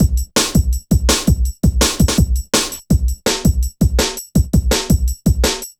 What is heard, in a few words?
Programmed Stepz, Jungle Drum Loops